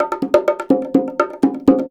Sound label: Percussion Loops, Bongo & Conga; Houseworx